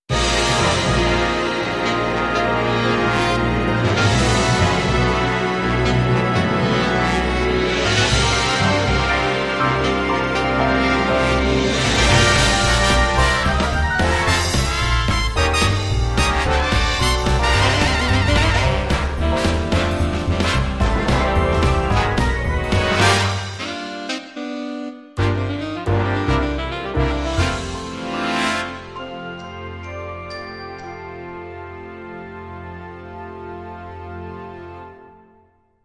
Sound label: funk